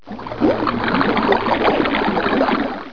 sualti; ses efekt

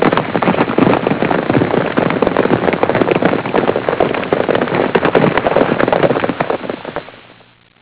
atlar nala, hayvanlar

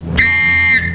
düdük; ses efekt

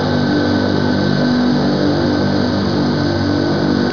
helikoptermotor; tasitlar

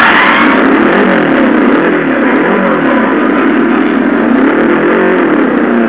araba motor; tasitlar

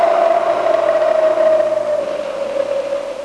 uluyan rüzgar; doga

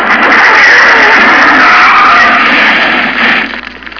araba kaza; tasitlar

